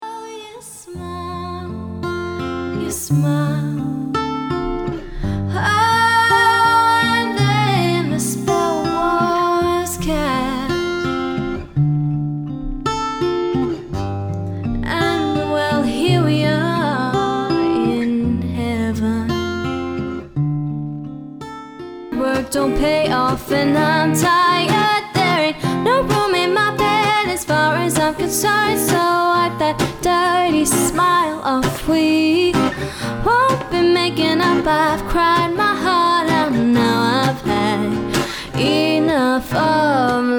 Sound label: duo live recordings